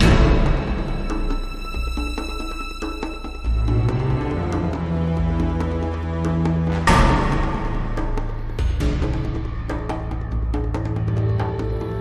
Electric Rock Opus - Loop

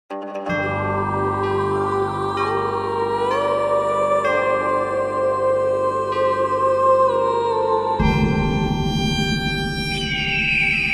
Jingle Lost; Helldorado; 2007 pc

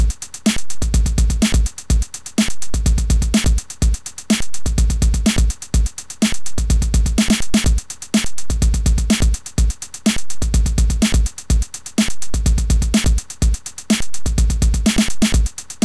Loading Tune; Leavin Teramis; Amiga; (Unused)

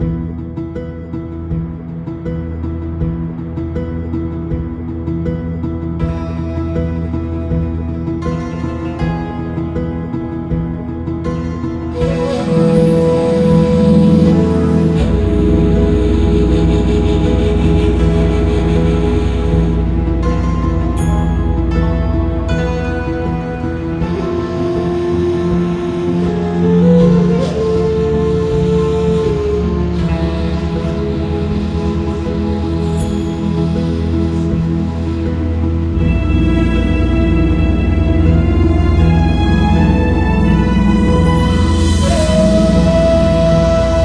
Build Up Arabia, gamerip

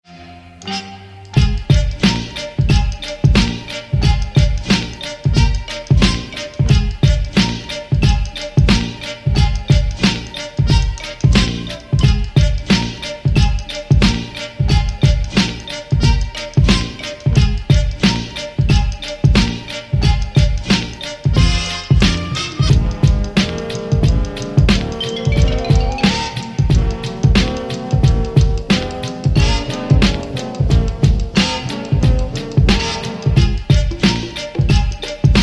whimsical toy factory type beat; Lisa the Vegaful OST; Rookie

